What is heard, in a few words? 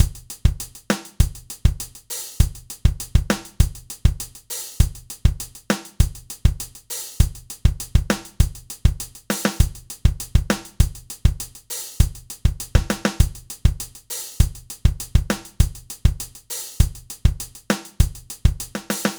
Drum Loop